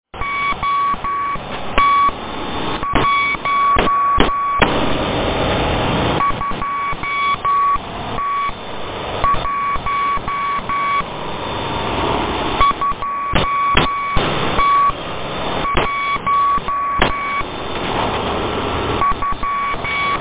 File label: radio transmission morse code